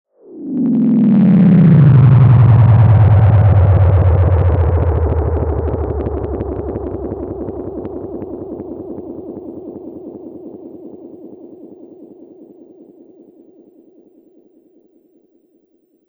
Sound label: memory man saturation